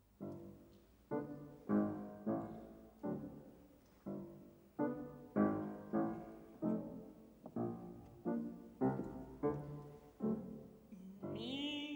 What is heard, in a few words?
for voice & piano